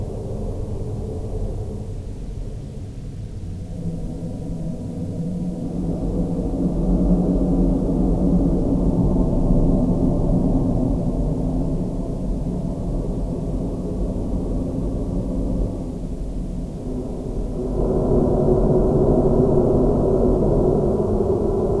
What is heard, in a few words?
flee